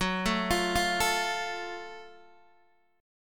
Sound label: Gb Minor Major 7th Gb down Guitar DADGAD